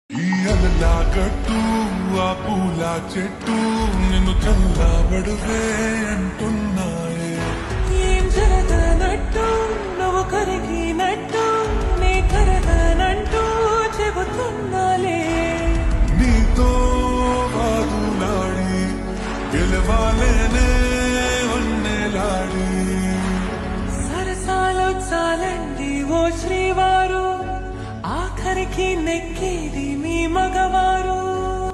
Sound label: Ringtone Slowed Reverb; Lucky Baskhar